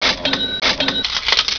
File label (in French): caisse